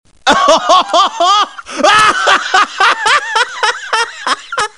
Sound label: chuckle laugh